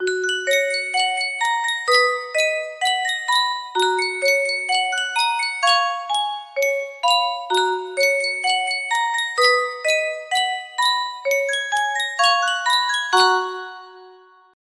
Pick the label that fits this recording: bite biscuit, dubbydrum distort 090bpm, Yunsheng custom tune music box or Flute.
Yunsheng custom tune music box